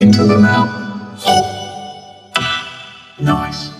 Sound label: hot food vocoded to the piano dub